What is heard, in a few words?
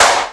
natural clap sample f key; single hits one shots